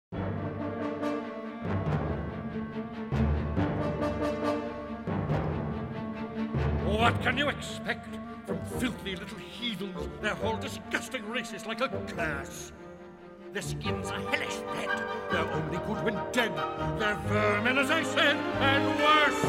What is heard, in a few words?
Chorus; Pocahontas